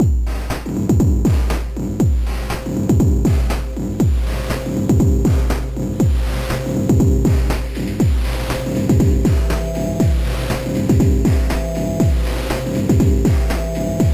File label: drum thing lf crunch; A quick experimental sketch, sort of a techno-themed piece